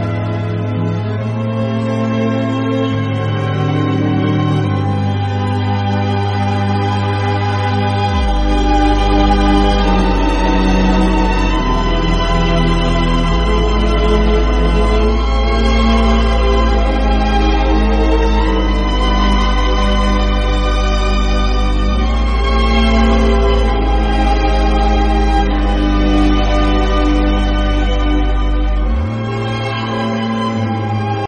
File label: projector violin; pluto